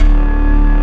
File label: Organ Bass D1; Proteus 2000; Digital Sound Factory Proteus 2000